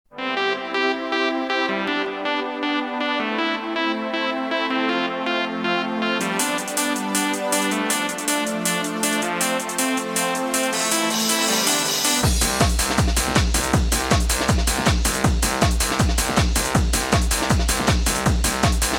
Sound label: Happy Hardcore Rave Mix